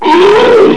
were pain, werewolf

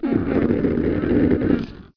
zombie poison idle

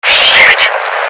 radio; Opposing Forces; FSoldier

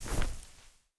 throw, grenade

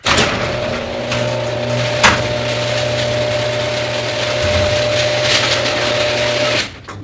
garage door

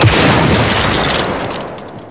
hit, weapons

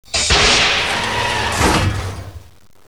ION OFF

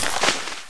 Striker12 Deploy

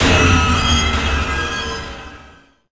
zombie smash surprise

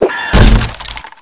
hunter jump

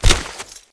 bomb draw, weapons

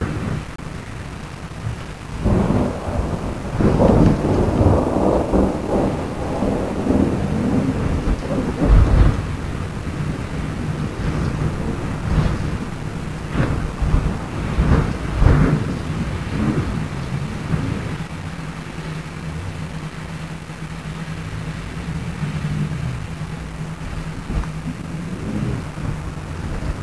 thunder loop

